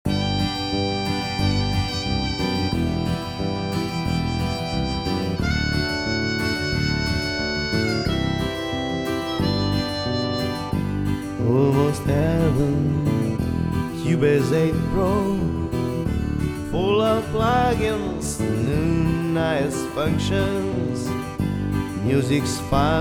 Country Chords

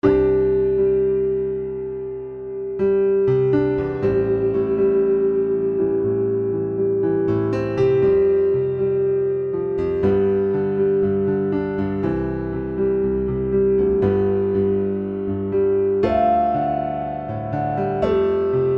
arturia filters PianoV2 Mini

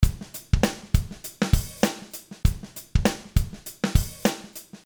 SSL G series soft; Liquid Mix

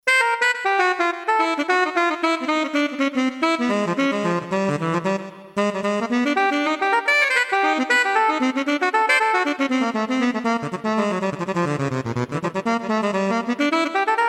woodwinds; IK Multimedia; iRig; SampleTank iOS

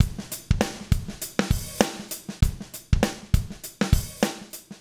SSL G series hard; Liquid Mix

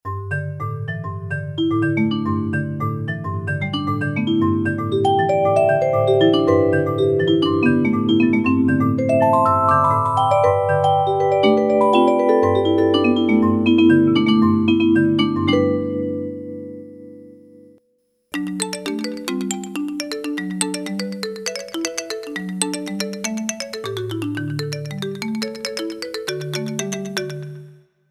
mallets; IK Multimedia; iRig; SampleTank iOS

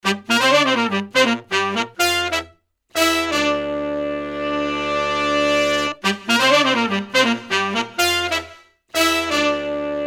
brass section; cubase 75 test

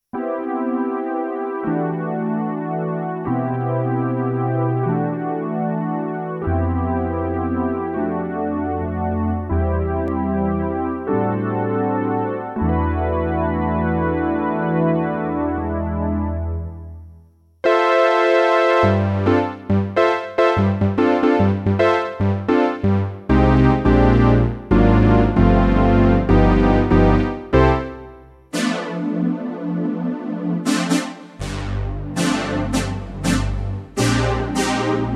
synth pads; IK Multimedia; iRig; SampleTank iOS

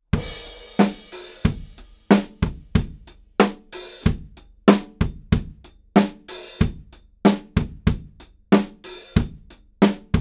TEST drums Mono; Rode; Apogee

